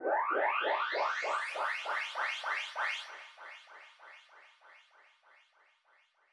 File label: Fx Wobble; SSO Fx